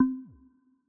add ad

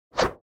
take item

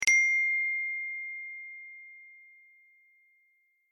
note, iphone notify

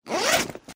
backpack open

